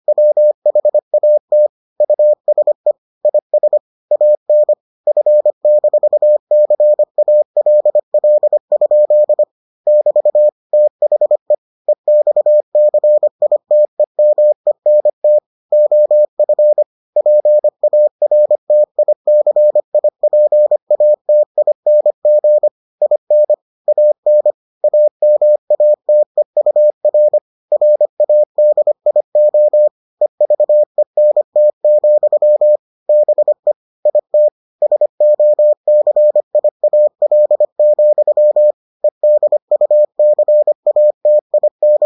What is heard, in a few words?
morse